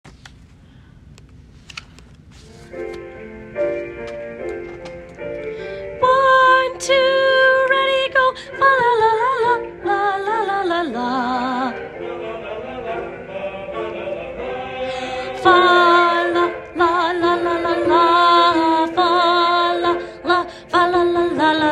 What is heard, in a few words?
alto